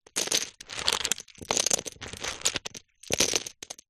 leisure dominos many pick up drop carpet several times